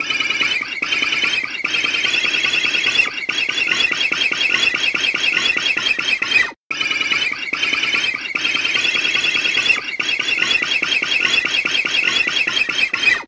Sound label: fret noise